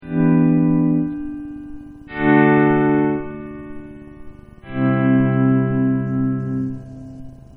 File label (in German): orgel